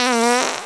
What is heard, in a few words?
fart